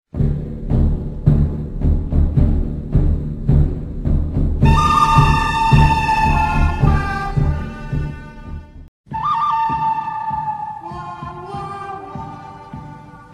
western standoff; cstrike; jailbreak

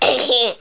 snow idle; cstrike; JailBreak

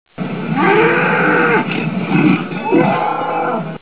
angry bull sound; cstrike; jailbreak

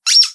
knife stab

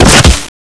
damage pain; cstrike; pubg

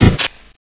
knife hit; cstrike; JailBreak; Defibrillator

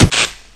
knife stab; cstrike; JailBreak; Defibrillator

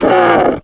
zombie death; npc zombie; cstrike; jailbreak

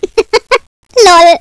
hit sound; cstrike; JailBreak

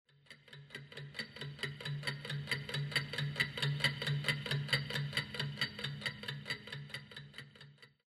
alarm thriller; cstrike; HyuNaS HNR

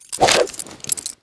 knife slash; cstrike; JailBreak; TribalButterfly

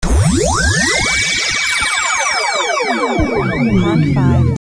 charge, Jail Break items; cstrike

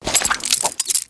knife deploy; cstrike; JailBreak; TribalButterfly

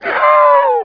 zombie death; npc zombie; cstrike; jailbreak

